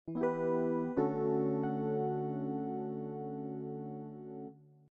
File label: Synthesizer; Piano app by Yokee